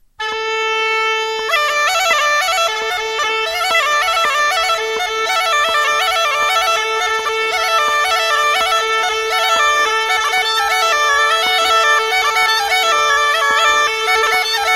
Marche vermot, France

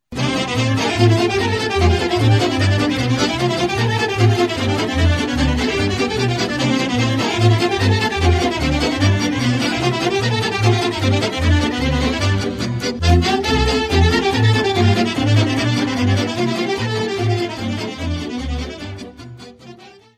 Briul banatean - Roumanie

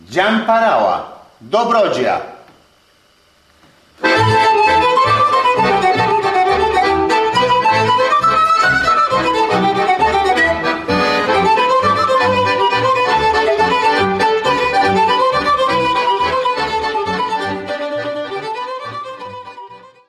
Geamparaua, Roumanie

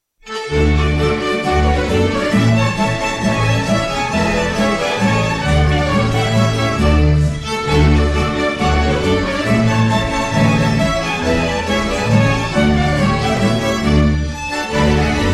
GALOP KONTRA, Danemark

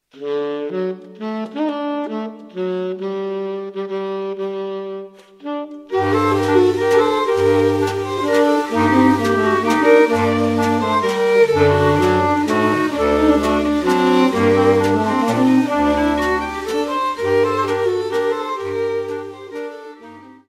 Vals - Valse - Belgique; Vals fran Enviken

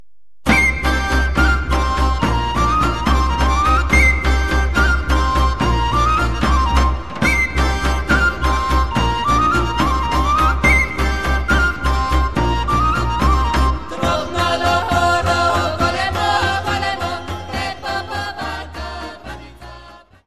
Tropnalo Oro Golemo, Macedoine

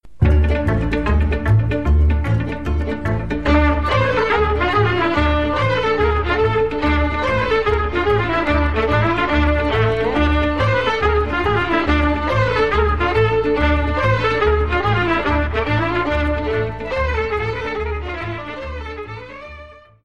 Arcanul, Roumanie

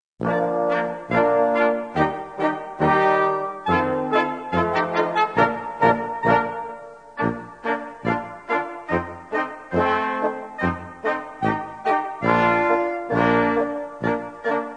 Nickelsdorfer Schottisch, Autriche

